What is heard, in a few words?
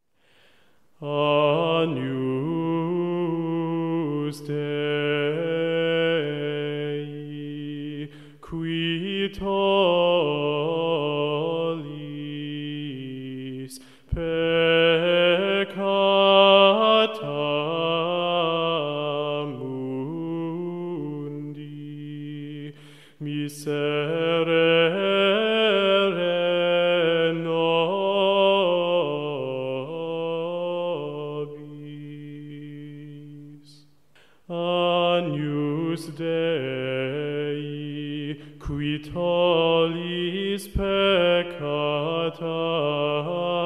Agnus Dei, choir schola; Mass ii